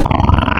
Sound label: Pig, Animals Wavetables; Cymatics; Serum; Animals for Serum - Gold Edition